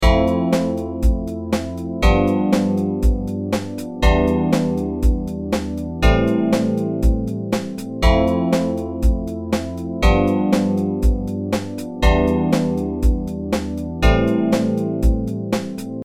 Fmaj7 G7 Em7 Am7

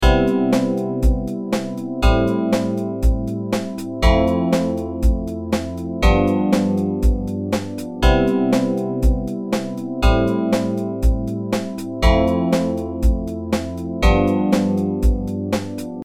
Cmaj7 Am7 Fmaj7 G7

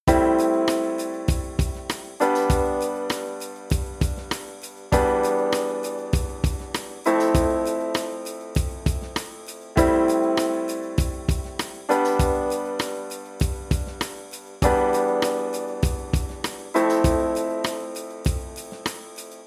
am7 g em7 fmaj7

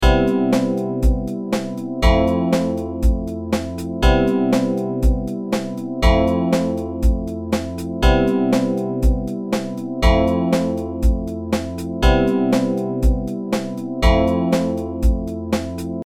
Cmaj7 Fmaj7

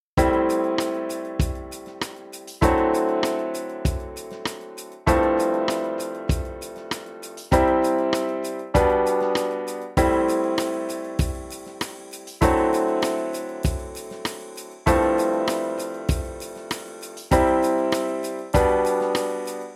Am7 D9 Fmaj7 G C G B

